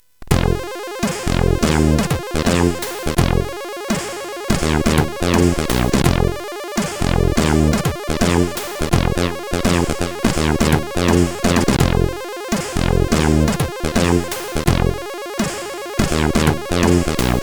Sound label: Funky, CSG8580R5